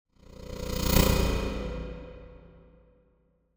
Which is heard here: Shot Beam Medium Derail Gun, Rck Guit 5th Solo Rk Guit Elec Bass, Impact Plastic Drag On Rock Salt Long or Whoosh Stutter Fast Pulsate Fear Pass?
Whoosh Stutter Fast Pulsate Fear Pass